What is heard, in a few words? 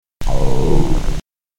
Minke Whale Growl